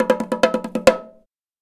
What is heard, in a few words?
140 TIMB, Indian Traditions; USB Soundscan vol.45; AKAI